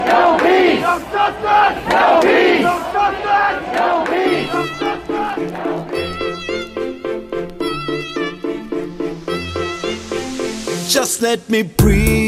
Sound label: Song Ringtone